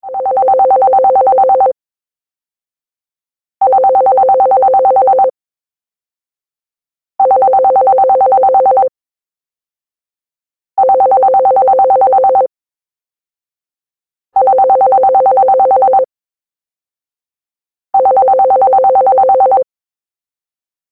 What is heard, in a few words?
landline phone ring ringtone